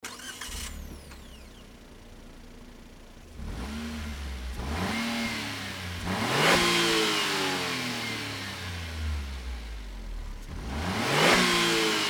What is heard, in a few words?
alto works ha36s normal